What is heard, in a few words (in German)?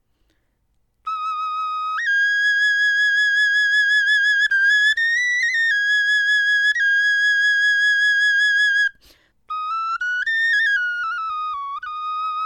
melodie